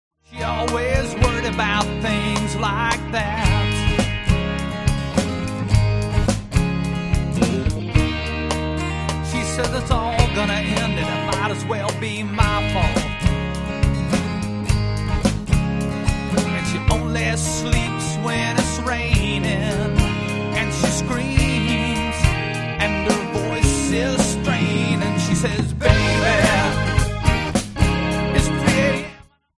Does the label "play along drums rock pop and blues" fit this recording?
Yes